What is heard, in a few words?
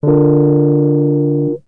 Rhodes, Keyboards; Drum & Bass; Total Drum&Bass